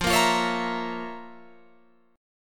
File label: F Major 7th Suspended 4th Sharp 5th F, Guitar Open F; strummed